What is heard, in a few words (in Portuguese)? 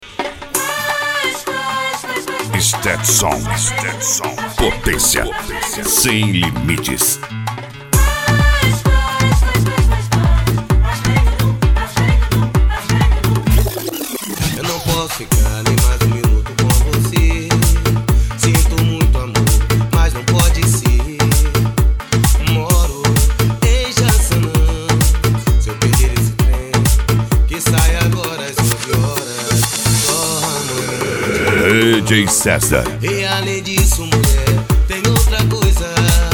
sound car carnaval